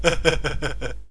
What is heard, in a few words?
pick